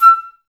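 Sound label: flute, Woodwinds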